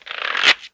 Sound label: blind up